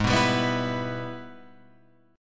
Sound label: G sharp Minor Major 7th Sharp 5th G sharp down Guitar Open F; strummed; JGuitar